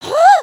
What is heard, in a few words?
gasp